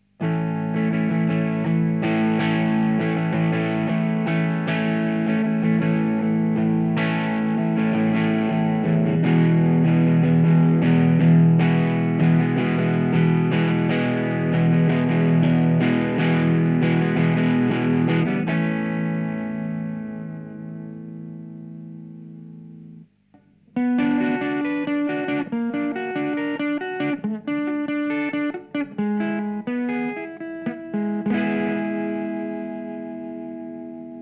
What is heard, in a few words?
MARSHALL PLEXI LEAD 100